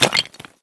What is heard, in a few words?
run tile; Escape from Tarkov